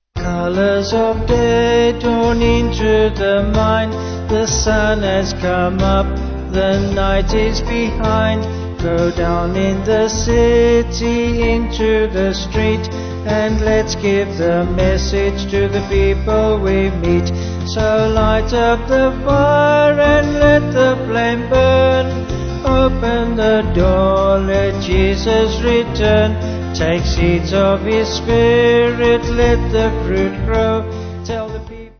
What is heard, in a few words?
Vocal Band